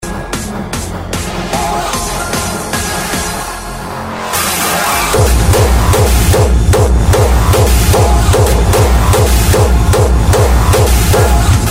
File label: hardstyle